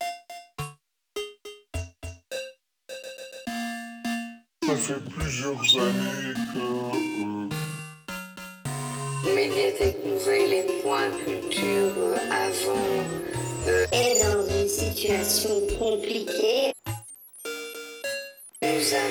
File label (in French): jingle boule de billard